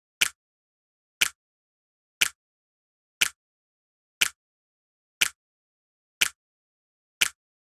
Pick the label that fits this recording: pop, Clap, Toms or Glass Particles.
Clap